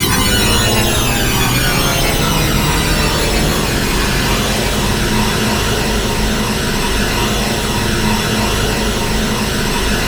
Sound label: ATM SYNTH; Best Service - XXL Production Effects